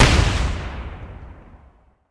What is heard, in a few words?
explosion